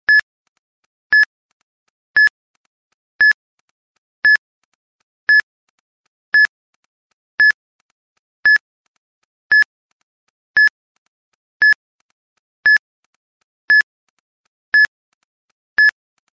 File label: Uber Beep